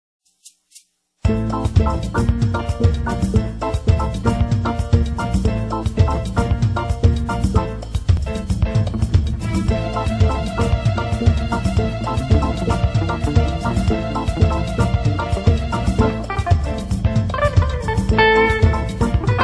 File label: Salsa 4 Elise Fur Elise